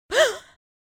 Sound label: Witch fantasy vocalization sigh surprised